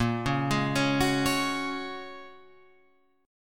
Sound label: Bb Minor 7th Sharp 5th, Guitar, Open F; Bbm7#5 arpeggiated